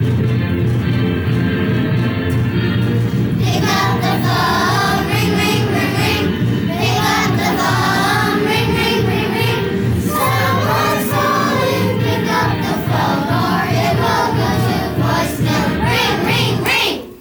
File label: ringtone